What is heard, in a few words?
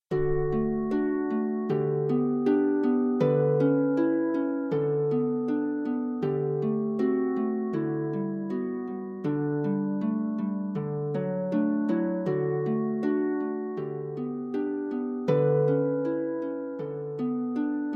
Harp, Sonatina; Harp Music from Argentina; Sonatina II